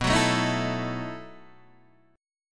B 11th B Guitar DADGAD, chord

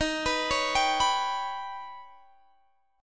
Eb Augmented 7th Eb down Guitar Half Step Down; arpeggiated; Eb+7

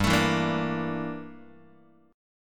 G Minor 6th Add 9th G down Guitar; Gm6add9 strummed; JGuitar

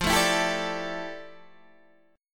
F Major 13th Guitar Open D

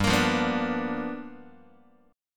G 7th Sharp 9th G chord, Guitar; G7#9 strummed; JGuitar

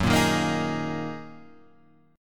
F sharp 6th Add 9th F sharp Guitar Half Step Down

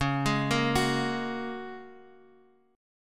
Bb Minor 7th Sharp 5th, guitar

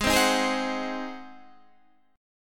G sharp 7th; G#7; strummed

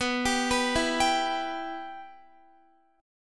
B Suspended 4th Sharp 5th B Guitar; Bsus4#5; arpeggiated; x,14,17,16,16,14

